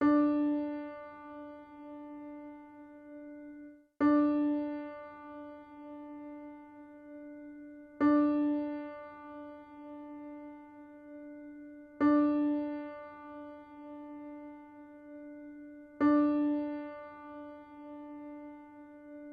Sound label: piano D